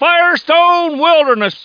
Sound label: FIRED; PC Gamer 4.2; mtm2demo